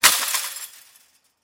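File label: sounds of chains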